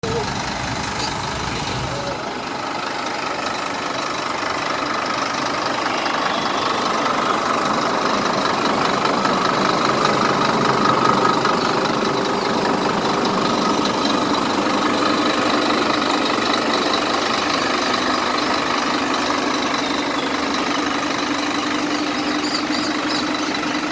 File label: Scania K310IA 6X2; Viale BRT